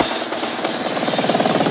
Fills, Loops 140 BPM

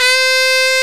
SAX COMBO

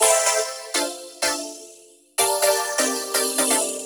Synth Pattern; Electrocution

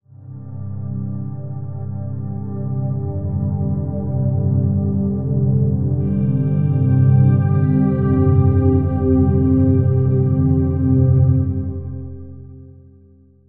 Thoughtful Synth, cinematic drama Soundbeds; musicradar

